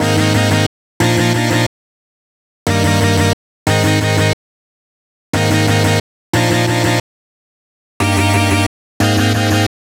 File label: songstarter 90 Eb, Pop; Slate; PASADENA Pop Sample Pack; Songstarters